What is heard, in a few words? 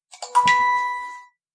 message alert